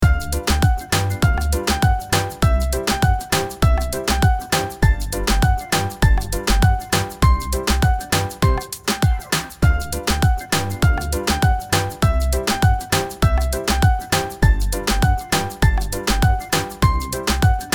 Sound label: reggaeton; liszt